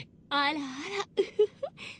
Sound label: Ara Ara Uhuhu